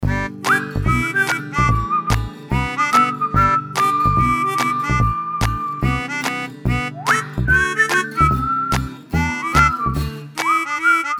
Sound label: Easy Stroll